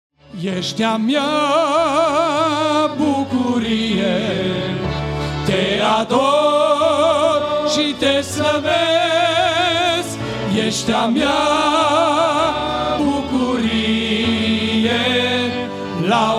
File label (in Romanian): Cantare in comun Tineret